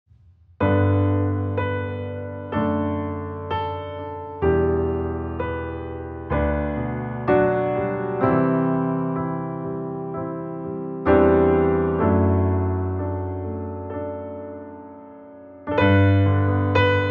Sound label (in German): Jetzt ist es Abend instrumental